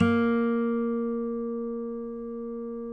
A#3, FNGSTYLE, Guitars; Spectrasonics; Hans Zimmer Guitars VOL-2; FNGSTYLE PFM

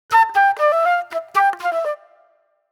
Movie Flute

